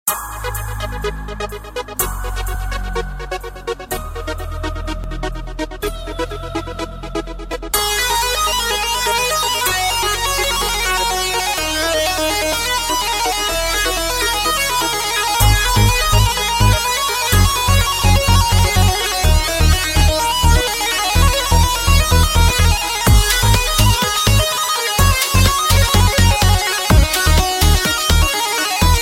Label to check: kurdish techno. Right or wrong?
Right